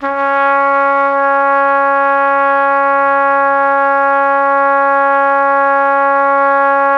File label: C#3, Pop Brass; Best Service ProSamples vol.19; AKAI